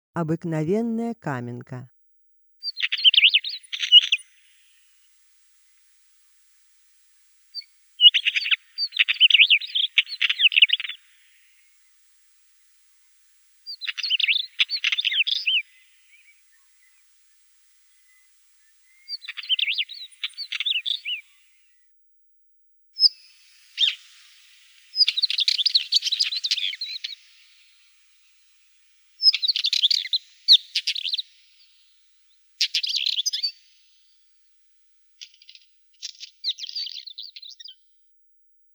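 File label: northern wheatear voice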